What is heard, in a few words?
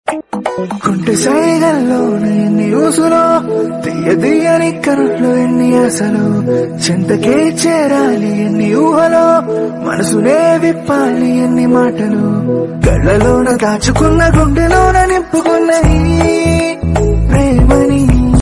love romantic bgm ringtone; anukokunda flim